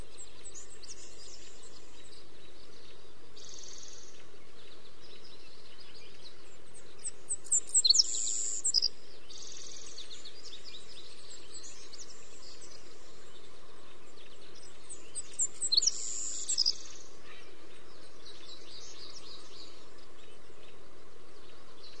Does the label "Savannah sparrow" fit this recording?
Yes